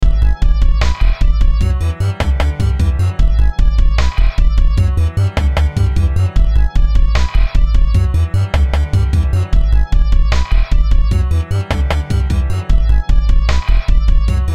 BEAT HIP HOP, Beat Loop Hip Hop Static Bass Warble Piano Groove; Miscellaneous Loops